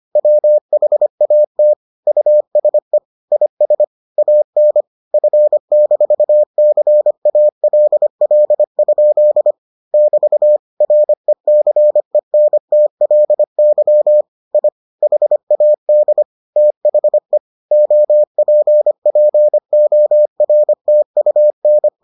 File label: morse